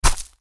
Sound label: Bounce Medium